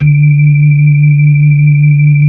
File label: B-3, Hollow